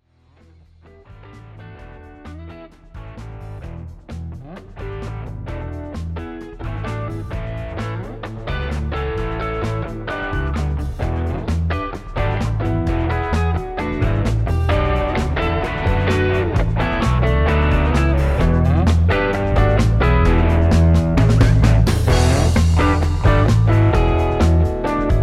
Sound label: rock